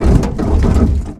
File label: car rattle; Blind Drive